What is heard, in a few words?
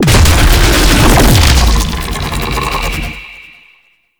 Phase Two Entry; Octopede; Warframe; ManInTheWall